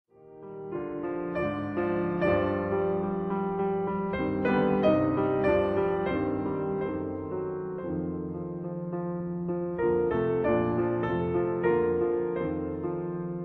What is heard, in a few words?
Piano Sonata in B flat major Molto moderato